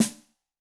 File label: Snare, Yamaha DTXpress II